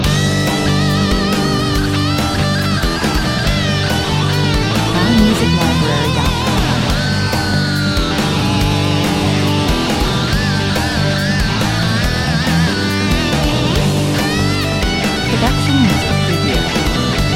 rock loop 140 bpm

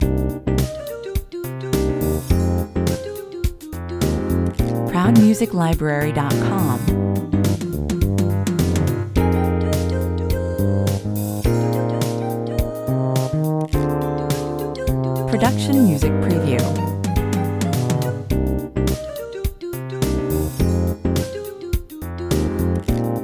jazz pizazz (fade) 105 bpm

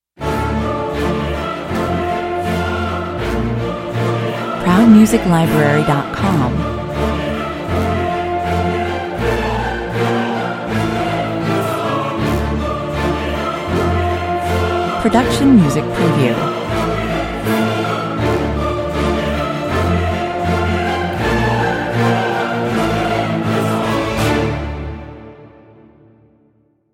no percussion

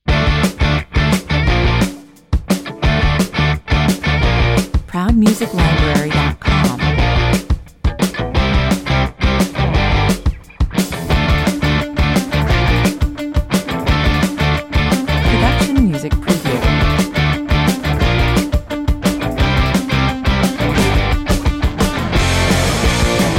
falling forward 172 bpm

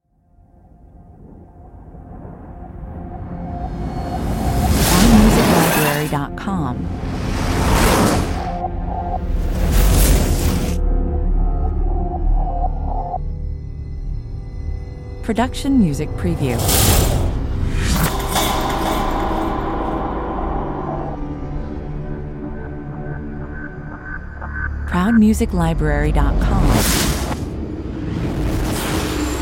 tension opener rev suction, 120 bpm

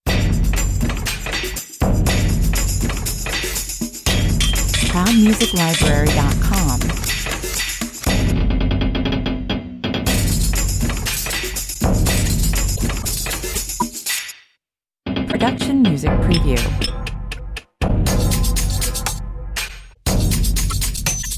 metalworks beat

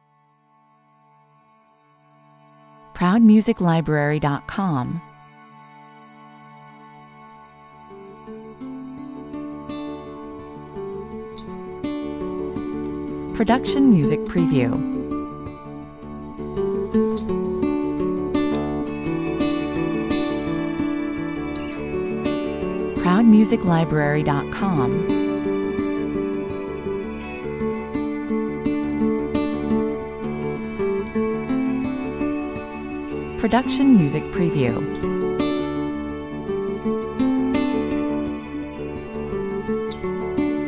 optimistic pastoral guitar, 85 bpm

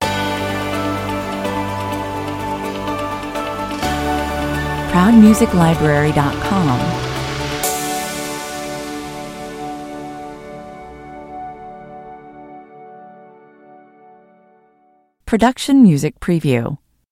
stinger

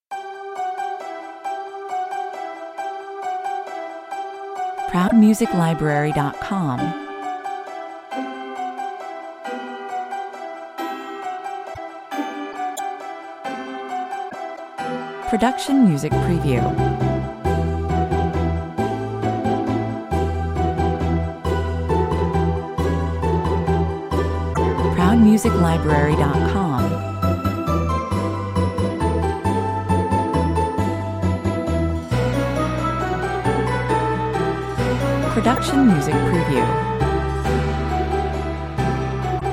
carol of the bells (no drum kit perc)

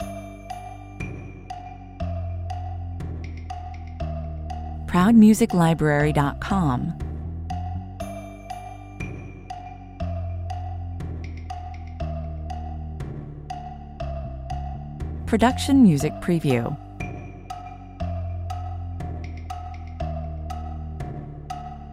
(bass drums no tymp crash cym)

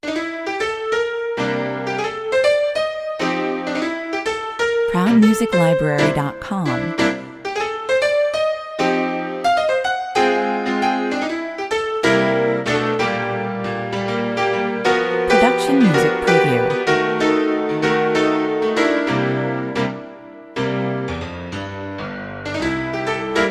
rag honky tonk 125 bpm

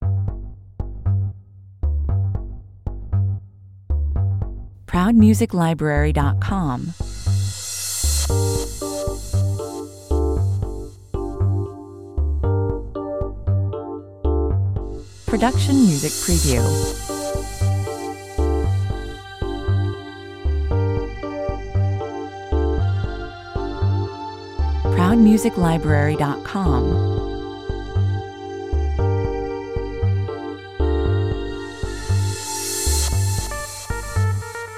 no drums, 118 bpm

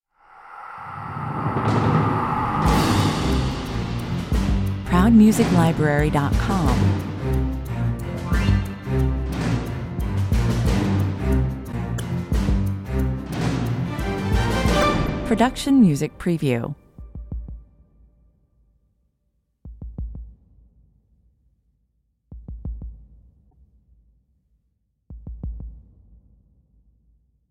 secret agent spy chase

